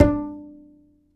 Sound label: G, Upright Acoustic Bass; Larry Seyer; SWITCHABLE POSITIONS